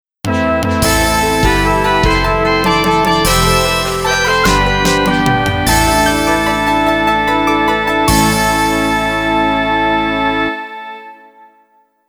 Last Stage Clear (MIDI Sound Source CM-64 Version)